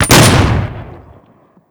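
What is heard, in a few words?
weapon shotgun double fire; doom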